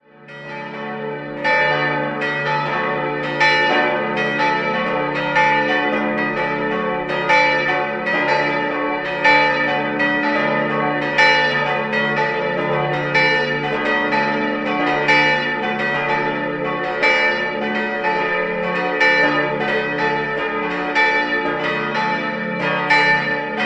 Bergen Chiemsee, glocken, Pfarrkirche St. Ägidius; in Erding gegossen; 1846; Reichenhall; Karl Czudnochowsky; Oberascher

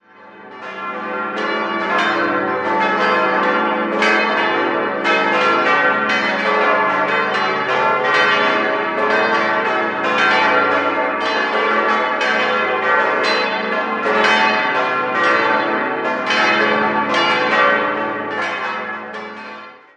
Cham Mariahilf, Glocken

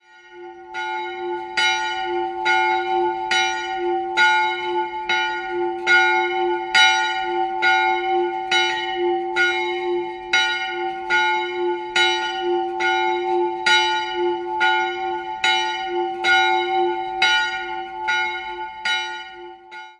Gebersdorf, Glocken

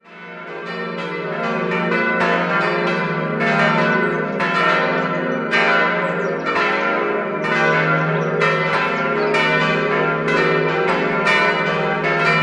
Neukirchen-Balbini, Glocken

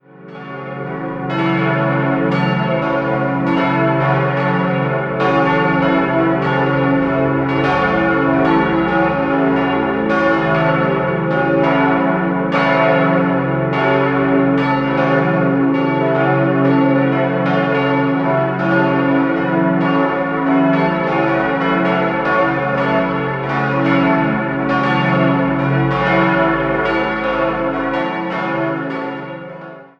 Haibach, Glocken

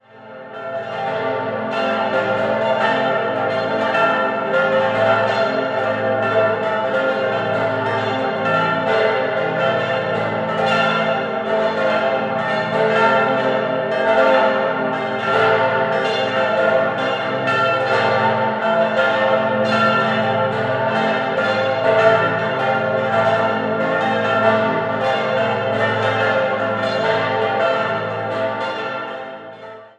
Menziken, Glocken

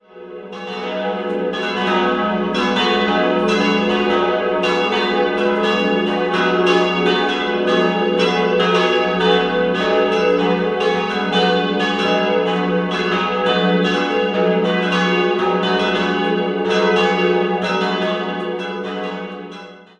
Hohenthann, Glocken

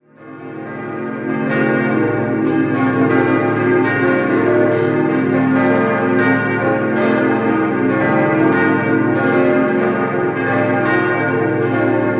Hiltenfingen, Glocken

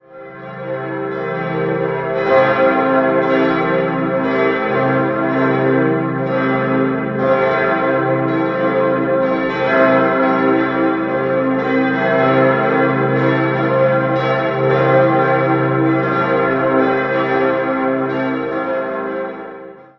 Dingolfing, Glocken, Pfarrkirche; Dingolfing-Höll; St. Josef

